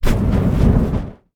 Robo Attack

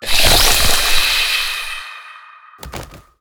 Honeyflower Death

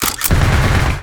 Tempest Attack

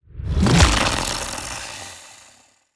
SeaSerpent Death

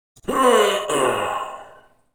Eternal Wanderer Death

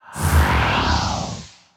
Proton Death

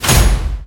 Attack

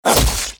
Dire Toad Attack

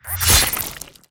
Cardinal Attack

